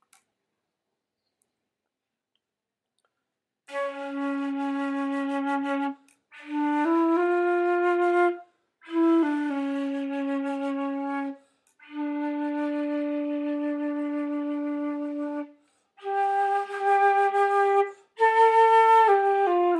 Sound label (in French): premieres notes de flute